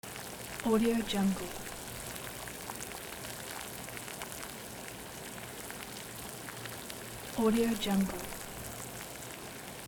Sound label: cooking